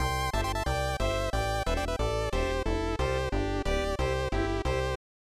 Failure; Super Shougi 1992 SNES

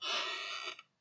rocking chair; Devour

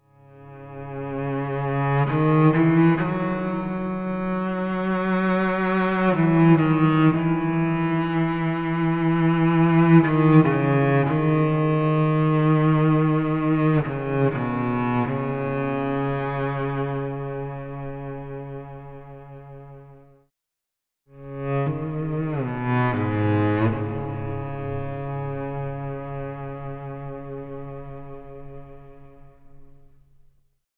Cello Solo; Battlefield 1; Title Cards Ep03

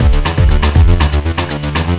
music loop; CSI: Crime Scene Investigation Deadly Intent The Hidden Cases; NDS gamerip

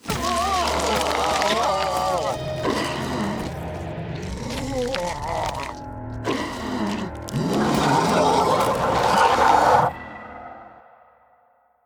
Enrage Transformation; The Inn; Zara

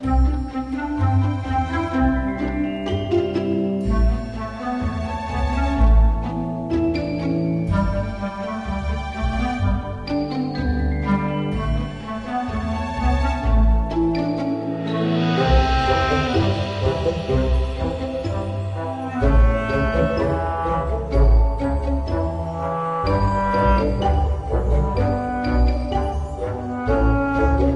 Game Music; Cut the Rope 2

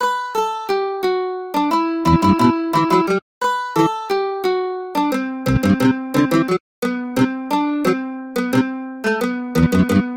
Mandolin; The Bard's Tale Remastered Trilogy

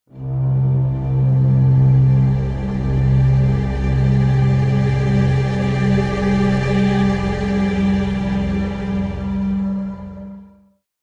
Modern Foreboding; Civilization Revolution; Xbox

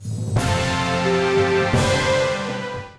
Jingle Sublevel Win; 7 Wonders II; NDS gamerip

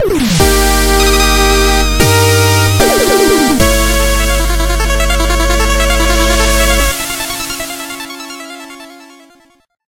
Music Stage Clear; Shovel Knight King of Cards

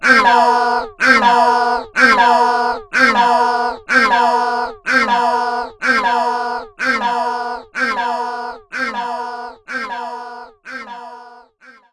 Pager Message; Amon Pager Message; yakuza 0 b sides ps3 ps4 windows xbox one gamerip 2015

